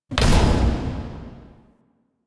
UI Mod Dectivate Pilot Weapon; Player Ship; Warframe; Railjack

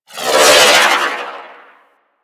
Arch Wing Prime Dodge Roll; Warframe; Vehicles

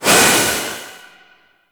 Void Release Short; Weapons; Warframe; Duviri; Incarnon Adapters

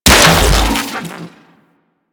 shock rifle fire; Warframe; Weapons; Corpus

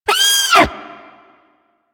Snow Critter Vocal Pain

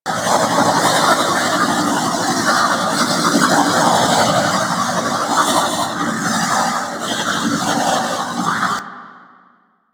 Gas City Tube Spiral Wind Loop; Ambience; Props; Warframe; Corpus Gas City; Props Remaster

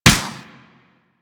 Space Ship Turret Fire; Warframe; Grineer Pursuit Space Ship

